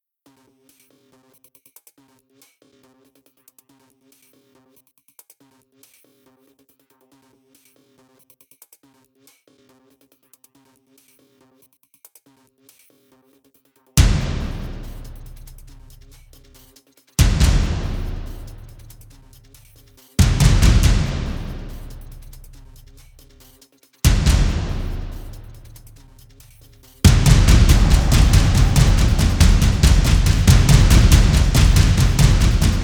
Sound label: percussion only